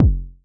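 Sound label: Club bass drum, Jomox Airbase99, Drum Machines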